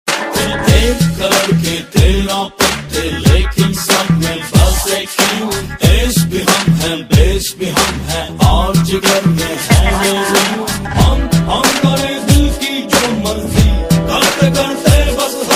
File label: ringtone